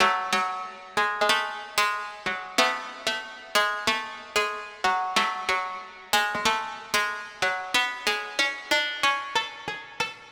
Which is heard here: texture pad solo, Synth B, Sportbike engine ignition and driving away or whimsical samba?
Synth B